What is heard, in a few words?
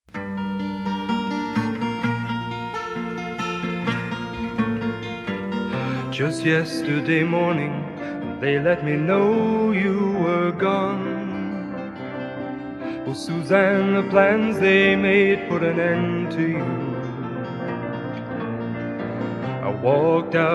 Vocal, Flute; USC -Pgh